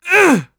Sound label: throw; UFC Tapout